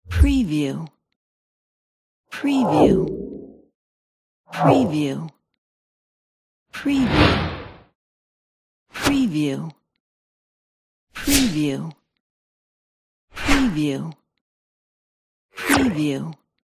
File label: whoosh swoosh